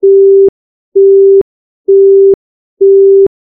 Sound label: Telephone; Rei Yumesaki